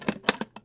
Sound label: Latch